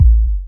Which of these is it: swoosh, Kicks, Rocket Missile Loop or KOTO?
Kicks